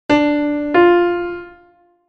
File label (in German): Intervalle sukzessiv